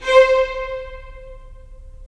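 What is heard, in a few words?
strings violins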